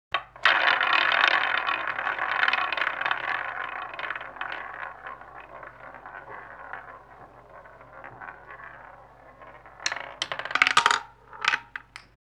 roulette wheel spin; Asian Show Sound Effects

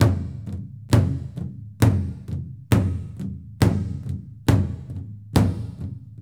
Big Drum in Gym

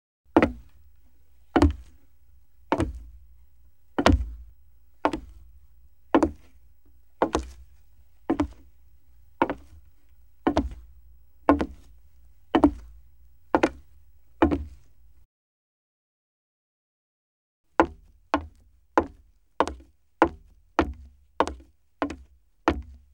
steps wood slow; Asian Show Sound Effects

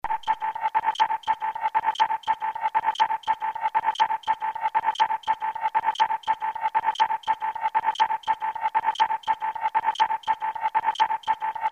sleep

